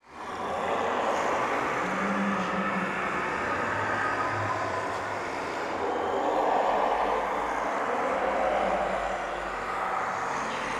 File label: cave; ambience